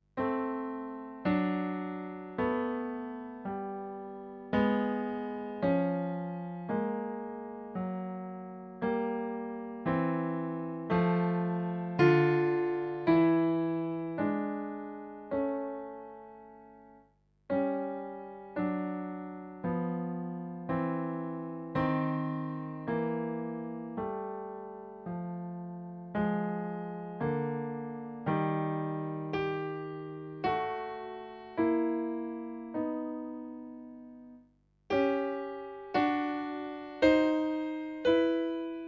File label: Piano